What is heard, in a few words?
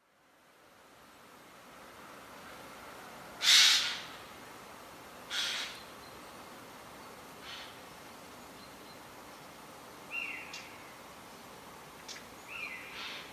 Garrulus glandarius; SETE